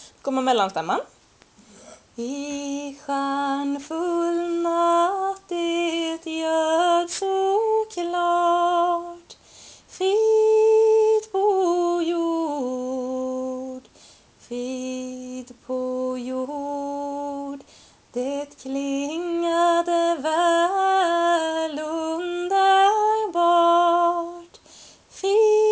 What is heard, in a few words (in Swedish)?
Frid på jord mellanstämma